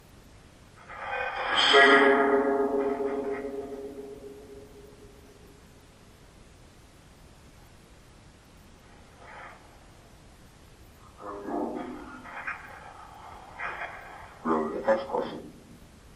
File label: Canopy Room Phasma Box